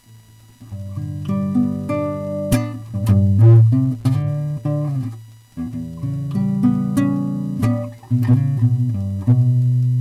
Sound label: Cave Jam